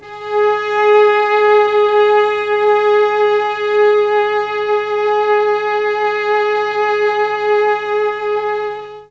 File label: G#4 300 VIOL, Mellotron; Mike Pinder - Mellotron Archive CD